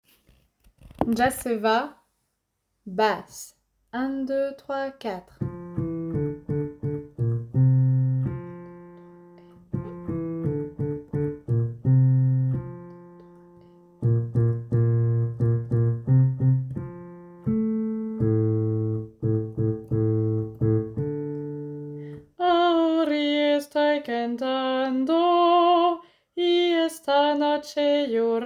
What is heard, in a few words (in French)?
Ya se ba Basse